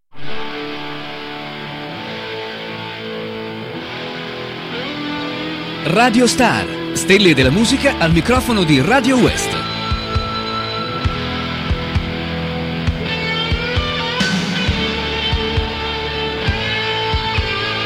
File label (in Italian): sigla; 1990; Radio Star